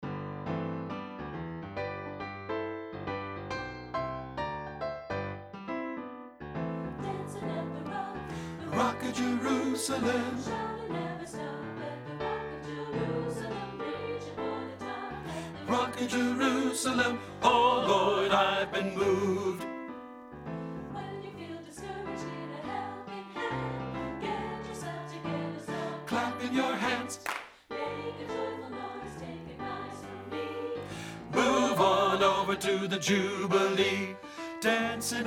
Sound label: BASS